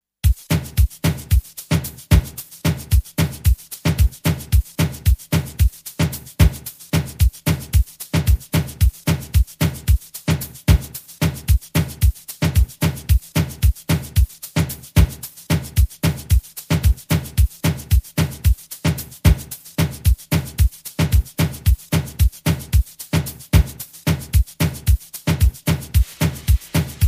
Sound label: Amapiano Mix